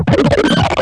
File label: hard robot boss